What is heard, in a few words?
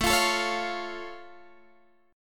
A Augmented A down Guitar Open G